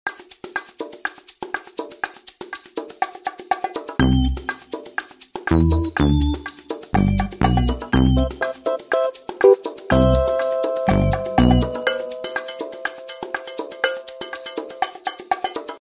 game loop; pet

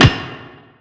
pipe hit tile; weapons; melee; kingpin

